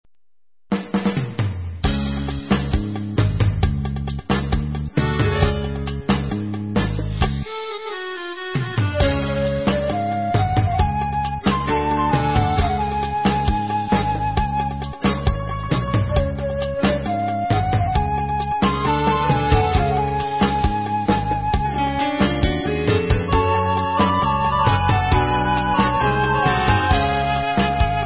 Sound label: Instrumental